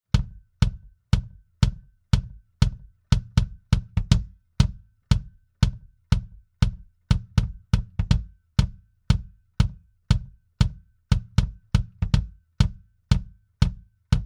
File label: IGS Audio 573EQ BD 573EQ